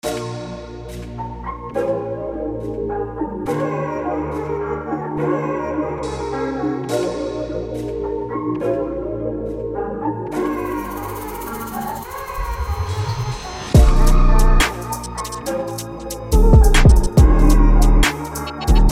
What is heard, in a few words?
Loops; MidiBox 3; Cartel